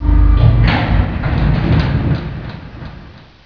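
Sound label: elevator stop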